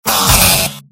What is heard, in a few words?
pain sharp; engineer; mvm